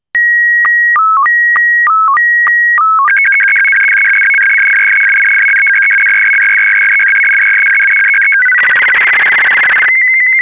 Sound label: SSTV AVT24, Hobby Radio